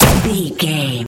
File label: sci-fi weapon plasma pistol